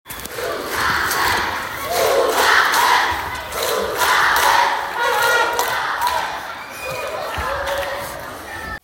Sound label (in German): Applaus und Zugabe; Die Moldau